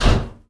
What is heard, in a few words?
Car Impact small; Smashy Road Wanted 2 android ios switch gamerip 2020